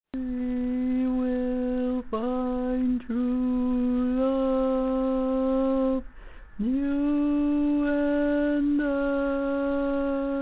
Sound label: Lead, barbershop